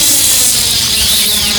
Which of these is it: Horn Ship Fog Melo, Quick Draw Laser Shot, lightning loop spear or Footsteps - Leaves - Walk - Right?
lightning loop spear